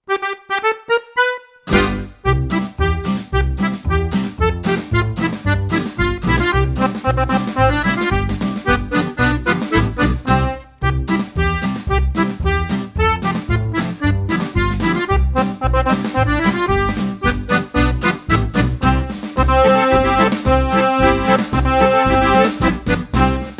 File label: Heel and Toe Polka